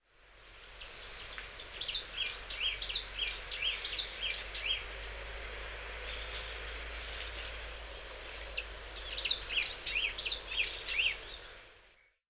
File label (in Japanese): yamagara, hyogo